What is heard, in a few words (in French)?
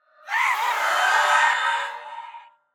Banshee Cri; Phasmophobia